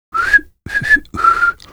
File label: fish whistling, fisherman; lostcoast